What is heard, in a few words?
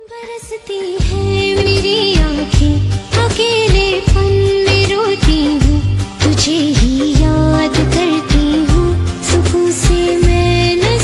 Old Hindi Female Ringtone